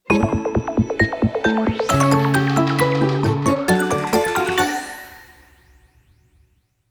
Recipe Jingle

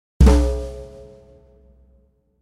Kicks

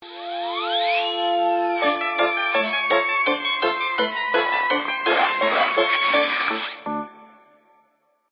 Axolotl 8bit

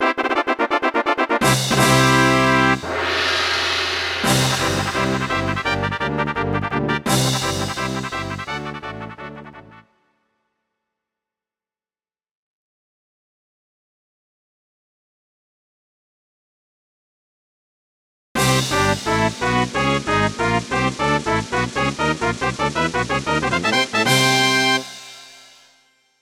Kickball (Colosseum); Banjo-Tooie; N64; Mayahem

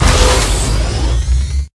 Pickups

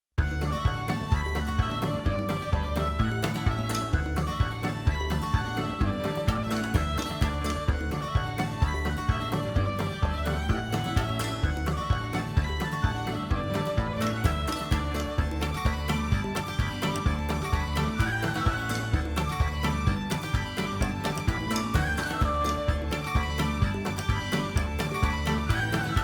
Minigame; Orge Oustin

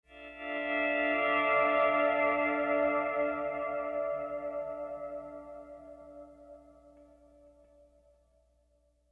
Pleasant Guitar; The Last of Us; ML Pleasant Guitar Mood

